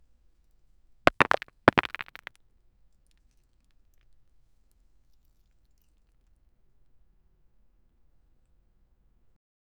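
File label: small rocks falling hitting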